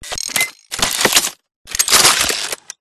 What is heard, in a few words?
MECHANICAL MORPHS; PMSFX